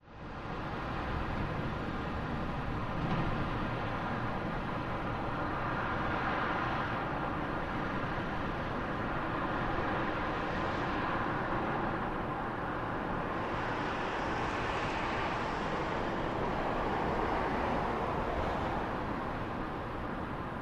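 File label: City Skyline Night Traffic; big city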